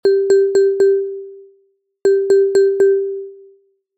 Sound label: ringtone